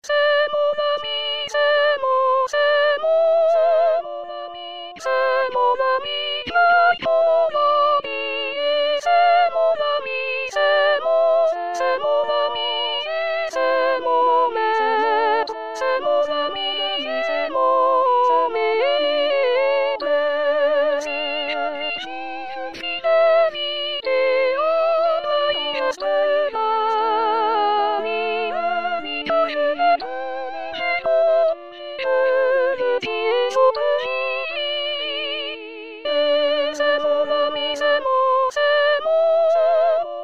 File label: soprano; cest mon amy cfm; 2016 st jean